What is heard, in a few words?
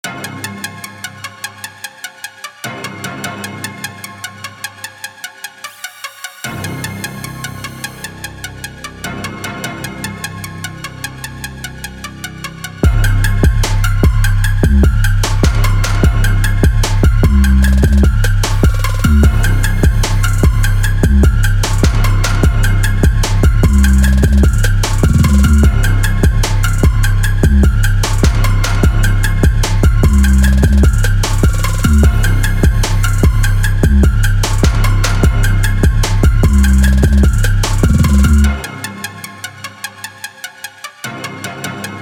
75Bpm